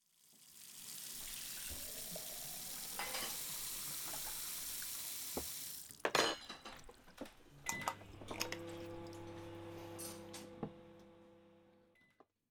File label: Kitchen